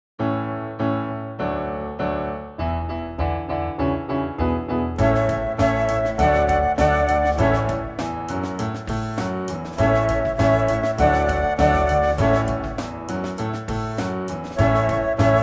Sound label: Rock Flute